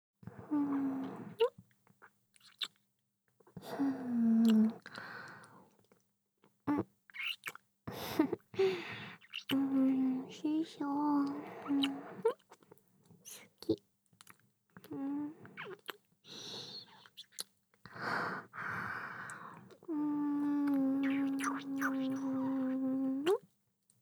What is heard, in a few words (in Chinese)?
kiss only音轨; 芙卡; KU100; 炼金术师